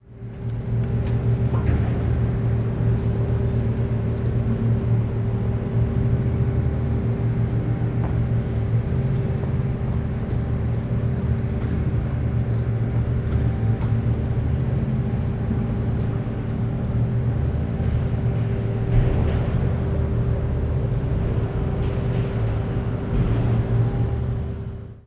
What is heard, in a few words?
Campus Ambience; UBC